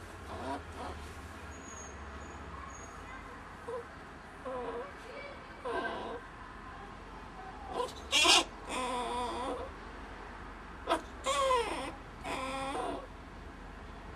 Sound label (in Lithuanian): verkimas verkia nubaustas